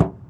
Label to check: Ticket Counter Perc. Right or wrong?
Right